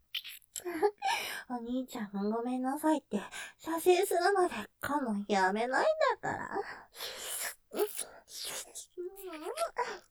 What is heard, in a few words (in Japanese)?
舐め; おにいちゃん射精するまでやめないんだからｗ; ステレオ; ループで楽しむ！シェフの気まぐれ抜き抜きコース